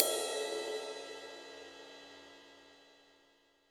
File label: HiHat & Crash, Roland TD-20; HiHats